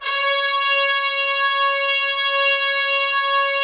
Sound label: Trumpets, BRS TPS 2EL; Roland - LA Composer vol. 4